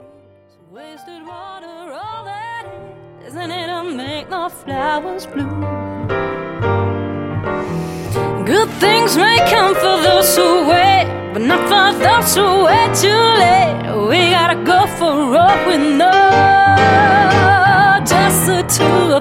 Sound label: vox piano